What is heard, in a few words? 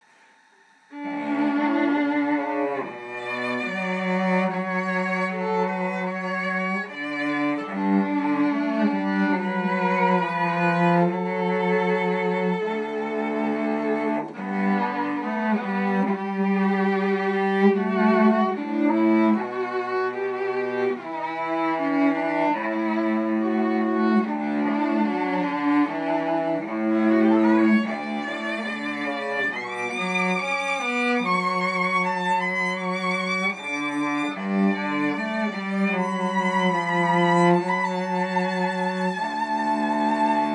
Vln Cello Duo